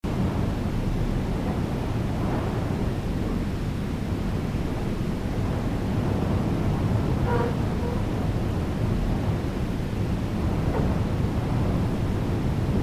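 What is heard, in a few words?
Ambience Interior Room Quiet Loop; the unfinished swan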